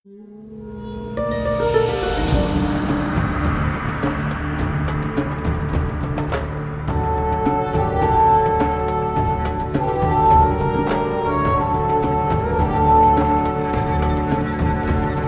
Music Desert laning

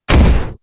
Shockwave; Satyr Tormenter

